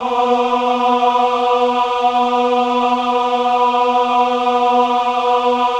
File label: VIB B3, VIBRATO, Voices; Spectrasonics